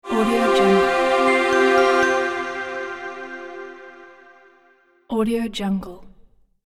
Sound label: Subtle Logo Reveal